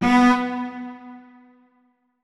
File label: notes, keyboard; Hideout Furniture